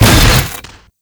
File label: Staff Impact A, weapons